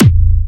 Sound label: Fatterr, Big Room Kicks